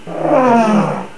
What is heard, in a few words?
gurp; opentdm; camomadcow